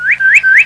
BIRDSONG; psion cd vol1

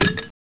Open Bottle; psion cd vol1

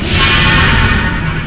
Future Sound; psion cd vol1

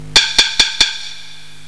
STICK; psion cd vol1

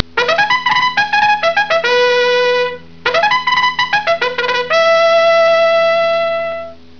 Trumpet Call

